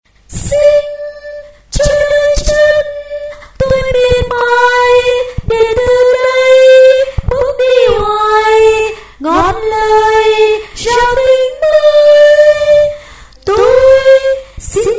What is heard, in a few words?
tenor basso